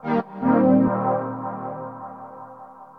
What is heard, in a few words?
SYNTHPAD AMBNT 160 C; eJay; Ambient; Sphere